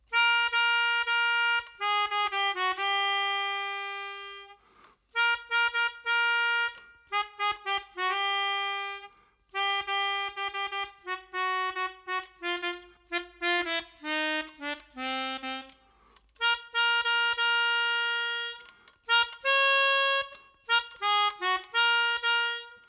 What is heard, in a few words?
sax; timbri